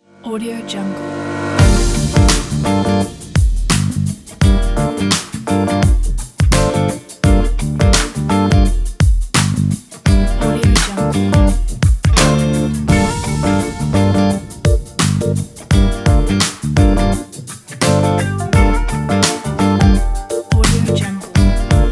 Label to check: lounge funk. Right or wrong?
Right